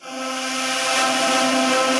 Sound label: Ambient & Evolving; Ableton; Glitch and Wash